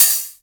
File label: hi hat open, Korg Electribe ER-1; Kit 8